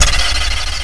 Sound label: door; jorito; wdoor